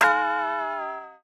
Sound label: alarm siren funny cartoon